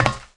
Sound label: Foot Grate; Player; Footsteps